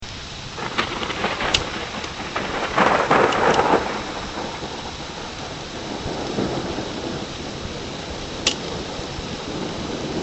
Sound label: 2 bolts, thunder